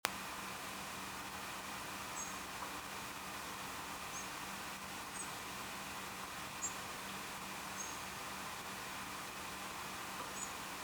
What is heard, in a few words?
Erithacus rubecula; SETE